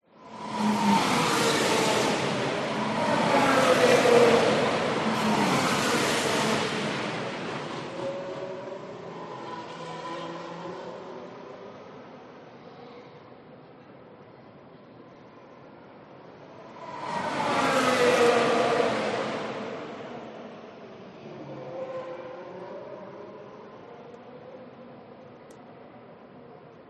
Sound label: f1 cars race 25m distance track training voices in bg singapore